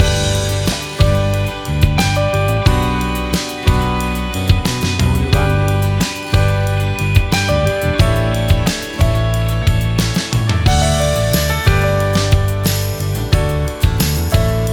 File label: Acoustic Guitar